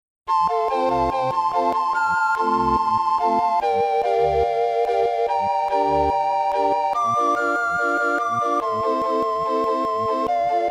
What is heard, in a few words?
recorder quartet